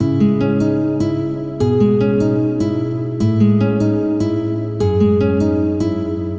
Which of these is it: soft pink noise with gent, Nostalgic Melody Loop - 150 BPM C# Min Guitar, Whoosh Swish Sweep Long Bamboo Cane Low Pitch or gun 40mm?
Nostalgic Melody Loop - 150 BPM C# Min Guitar